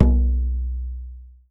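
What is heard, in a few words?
large brass, dunbek; Voice Crystal - Steve Reid Definitive Percussion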